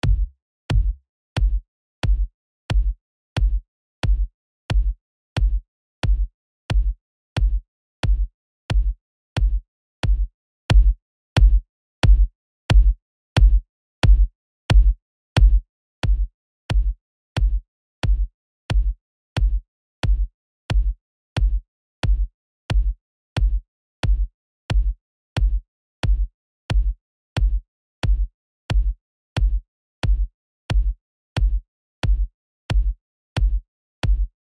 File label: Drum Beat